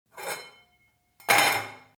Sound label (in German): Kochtopfdeckel